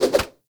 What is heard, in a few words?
Bayonet Melee Combo; Warframe; Tenno